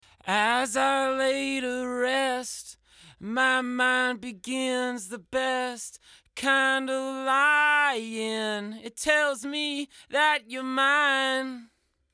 chant sans effet; psp nexcellence

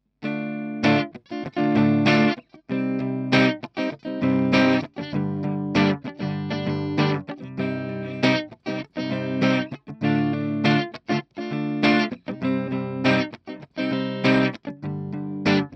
guitare electrique avec fethead

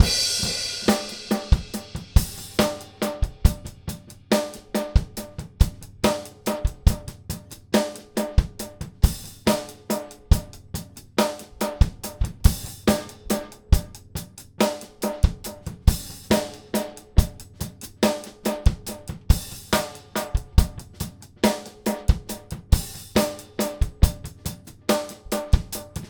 batterie delay; timeless 3

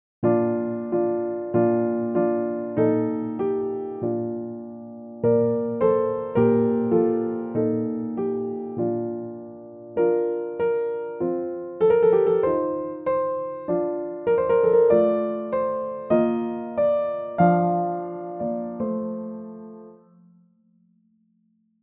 piano virtuel; gentleman

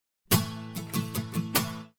Sample Guitare Acoustique Transitoire; Eventide Fission

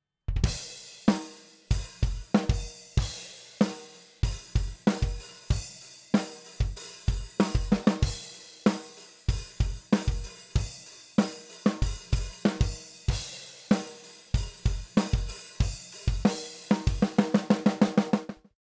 drums sans effet; stoneq 4k

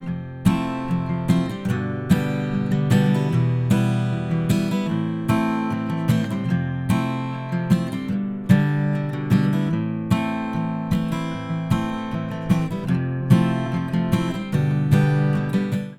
guitare mediums avant

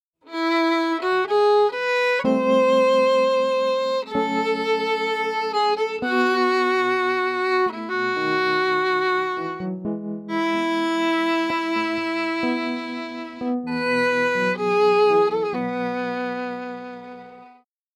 violon sans effet; psp nexcellence

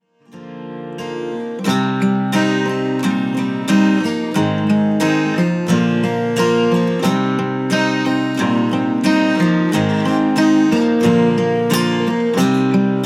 guitare avec heavy; tapedesk

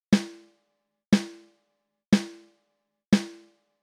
caisse claire avant egalisation

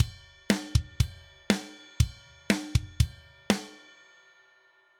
drums sans drive

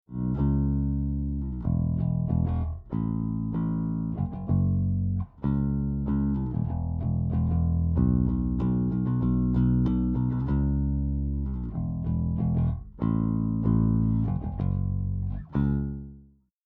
basse sans; overloud comp g

